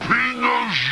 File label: pl gun, weapons; svencoop; cracklife